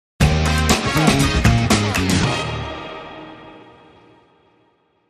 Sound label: Sting